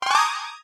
Parry

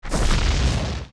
Scarecrow Summon; zelda; OOT

